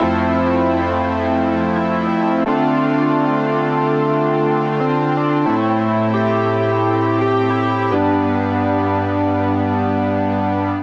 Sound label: Process Keys C Major 88 bpm; MIDI Clips; Tonal; Accompaniment; Ableton; Factory Packs; Chop and Swing